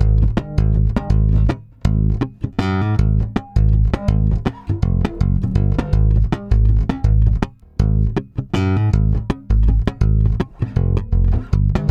STRUM A#, Bass; Spectrasonics; Bass Legends; 88 STRUMMIN

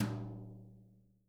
BRUSHES, Living Drums

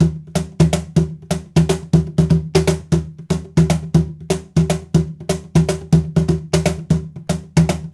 120, Box Brush; Spectrasonics - Supreme Beats - World Dance

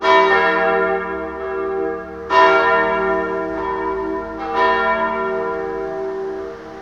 MORE BELLS, Cathedral Organ; ILIO; Propeller Islands

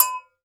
GANKOKWE, African Perc; E-MU Producer Series Vol. 7 – Old World Instruments

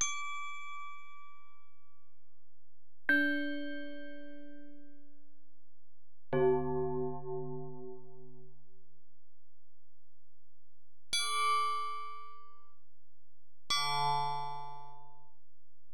Alesis DM-PRO Bell

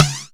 Flange Snares

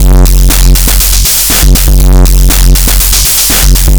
Noise Beat; Drums & Drones

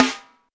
SD BONUS, Drums; Best Service; Real Mega Drums VOL-1

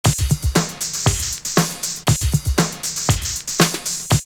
drumloops OldSchool 118; X-Static Goldmine; 90 s Sample CDs

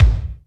BD, BD REAL

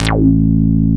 DIGITAL BASS, BassGuitar

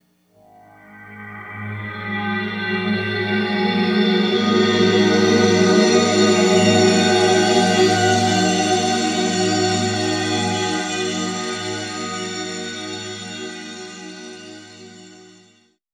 Hi Complex Voice; Sonic Foundry; Sony Creative Software; James Johnson Spektral Minimalism

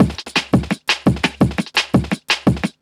Break, Drum N Bass Percussion

